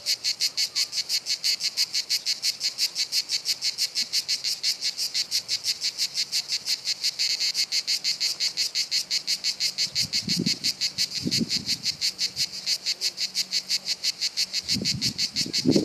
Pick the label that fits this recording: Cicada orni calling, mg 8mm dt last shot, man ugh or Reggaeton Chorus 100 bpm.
Cicada orni calling